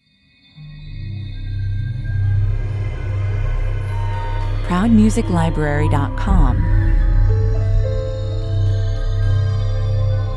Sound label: Mystic pads featuring bells and flute effects; Perfect for atmospheric and mysterious Openers and Trailers. Strange riddles and surpris